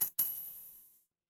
feedback success

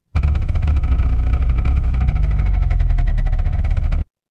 mechanical engine

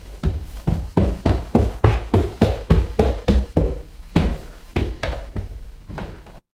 footsteps stairs

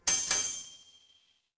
door doorbell

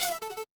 retro game game over

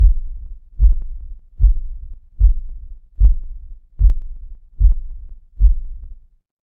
horror heartbeat

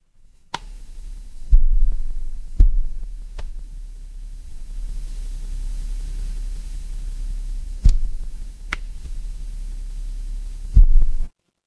ambience room tone

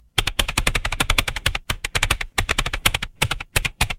office keyboard

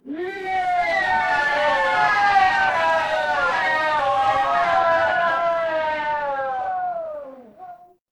crowd cheer

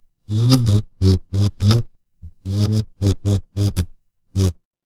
fire electric arc

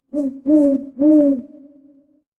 animal owl

